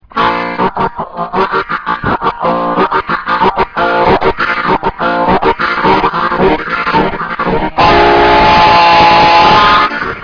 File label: Train